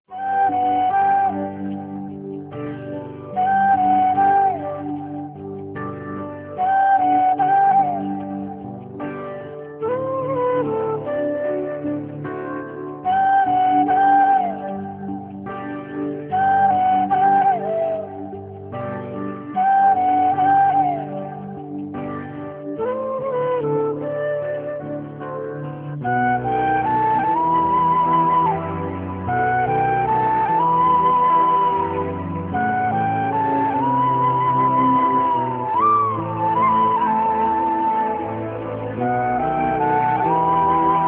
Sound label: Instrumental